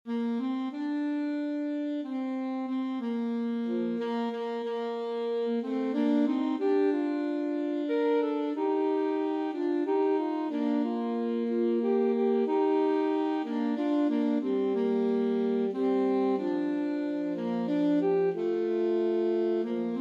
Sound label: shenandoah alto saxophone duet